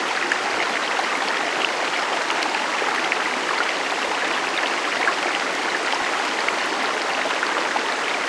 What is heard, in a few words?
streambed; Star Wars Knights Of The Old Republic I; XBOX